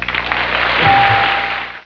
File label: ding